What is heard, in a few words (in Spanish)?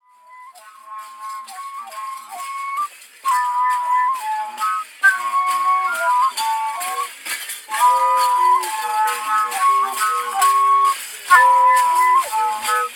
Pinkillos Tarabuco